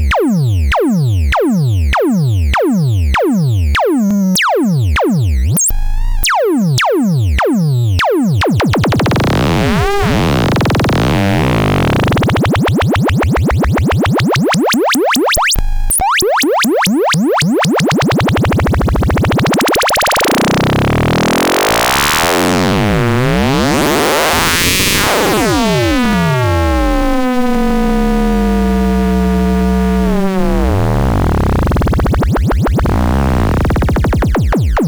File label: 2A03 triangle